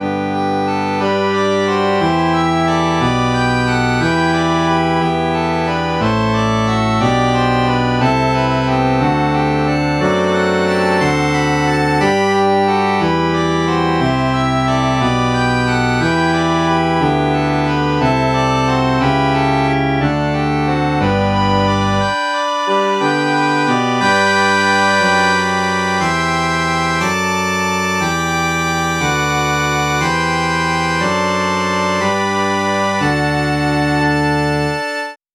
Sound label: reed organ